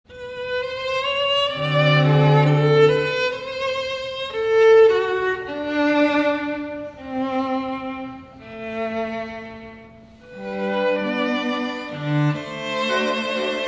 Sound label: waltz